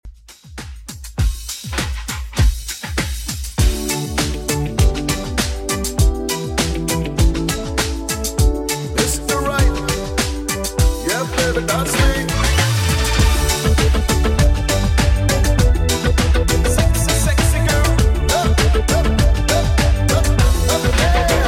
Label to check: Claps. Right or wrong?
Wrong